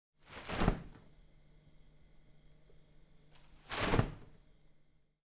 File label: Open Umbrella